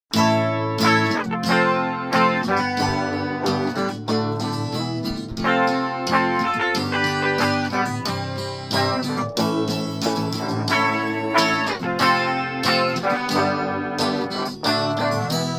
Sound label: Sample Electro version